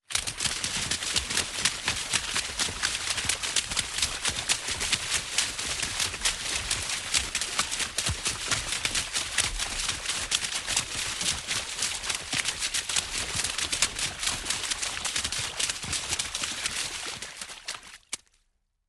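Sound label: group marching puddle mud, exterior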